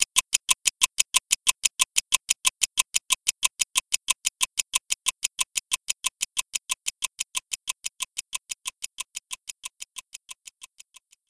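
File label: clock loop; Wario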